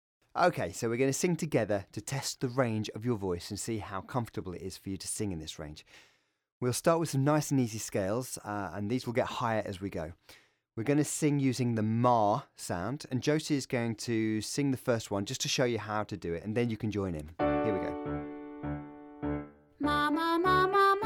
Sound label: Soprano Range finder test